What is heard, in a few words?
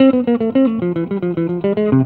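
electric guitar melody; Best Service; World Colours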